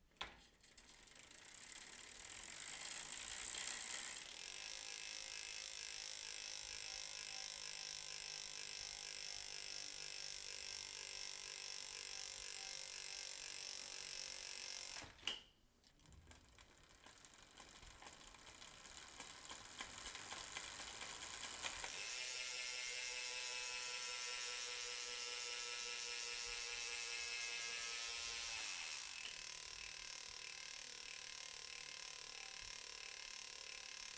Bike Wheel Spinning

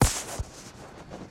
Soft Shot Gun Laser Blast

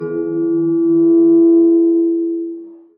Spiral Clip Holder

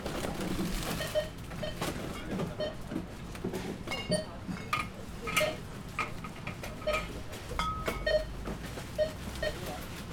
Supermarket checkout

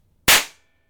Firecracker

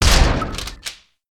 Shotgun

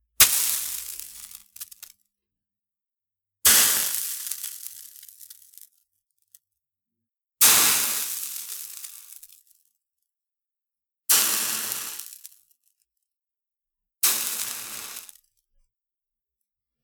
Drops On Ceramic Cooktop

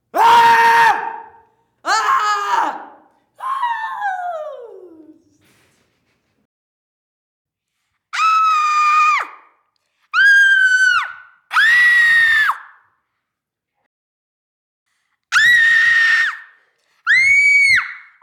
Individual Children Screaming

